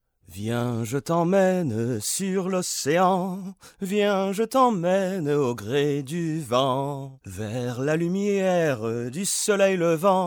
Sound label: languages men french singing